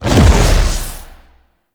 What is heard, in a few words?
Howl Jump; Warframe; Voruna